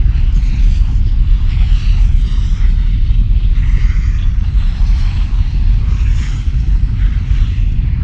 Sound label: fry idle, anomaly; STALKER; somber anomaly